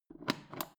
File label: foot switch turn on off